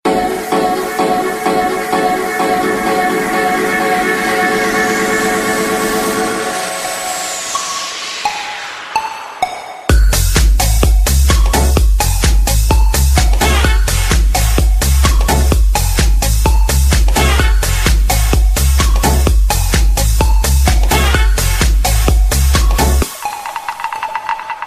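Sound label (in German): klassische techno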